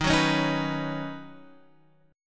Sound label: Eb Minor 7th Sharp 5th, down, Guitar, Half Step Down; chord